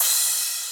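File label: cymbal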